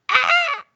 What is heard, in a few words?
Weird Scream